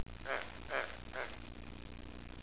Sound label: hog noise, toy